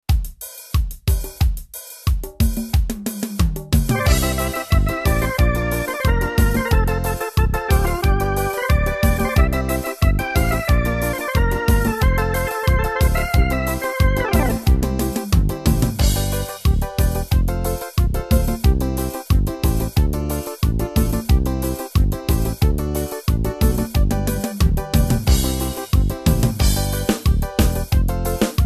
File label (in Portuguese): ritmos yamaha